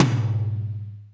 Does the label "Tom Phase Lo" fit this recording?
Yes